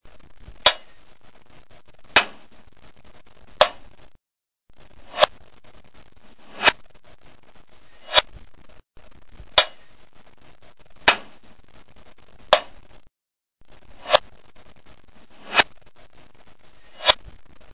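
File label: click test Plus Reverb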